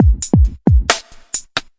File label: 134 dunk dunk dunk bap